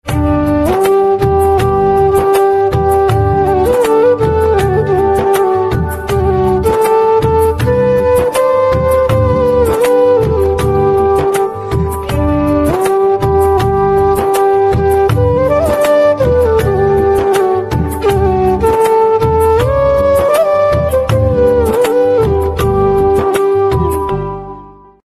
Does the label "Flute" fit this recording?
Yes